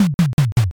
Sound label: drum fill